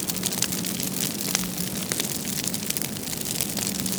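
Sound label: fire medium; emitters global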